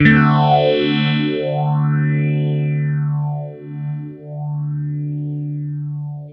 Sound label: Clean Phase D7 open, Guitar Special, clean phaser, chords